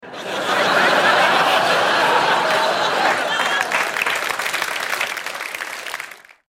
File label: laugh; Big Bang Theory